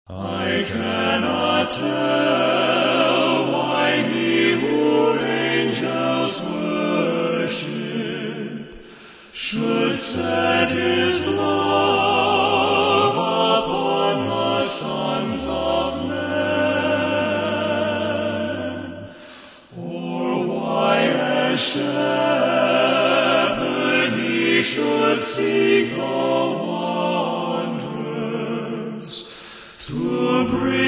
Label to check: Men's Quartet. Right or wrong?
Right